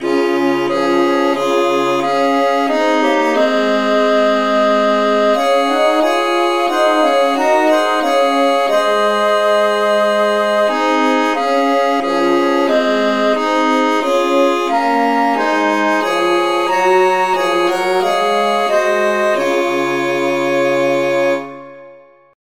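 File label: traditional, key C sharp; SAINTTHOMAS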